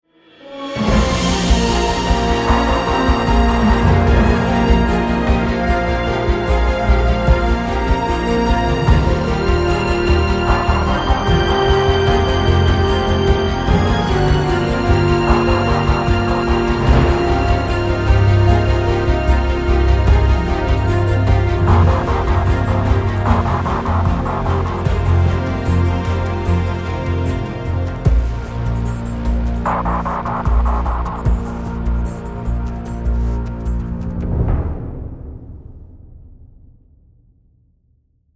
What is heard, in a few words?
Grand Historical Landscapes